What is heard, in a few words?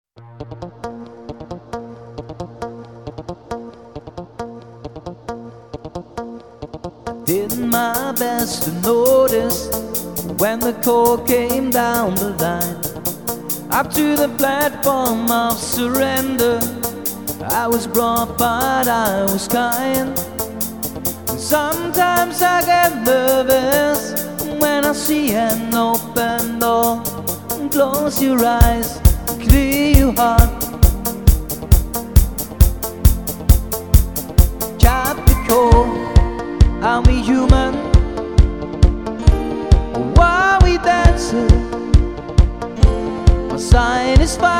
human kompressor